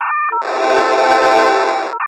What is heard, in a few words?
Robot Found a Crown, ringtones